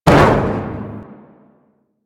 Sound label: Metal Barrel Impact; Warframe; Ambience; Nineteen Ninety Nine